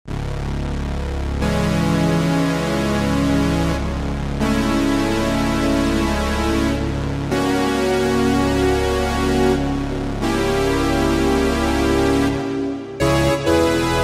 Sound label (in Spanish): teclado